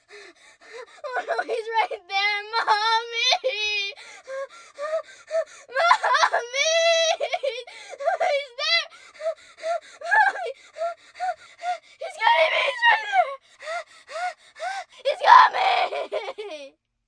Fear, Scared/Fear, 1 child, 7 yrs., crying, panting, being chased, with words; human voice, kid; Screaming